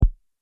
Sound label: mouseover